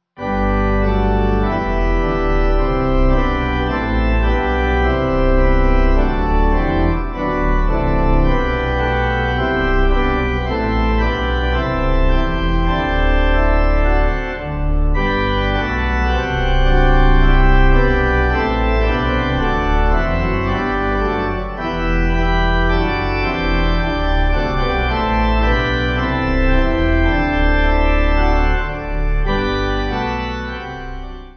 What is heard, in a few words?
Pipe